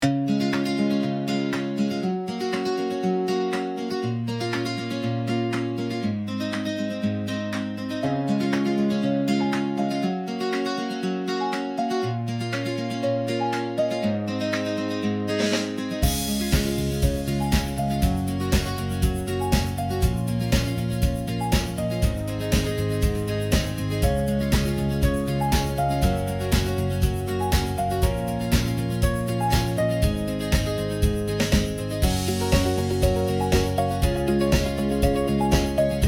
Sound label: Upbeat reflective lighthearted living